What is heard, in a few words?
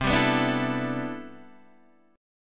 C Major 13th C, Guitar DADGAD